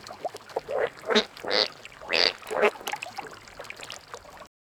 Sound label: splashing water as duck; crab